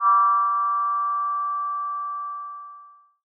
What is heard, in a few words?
scary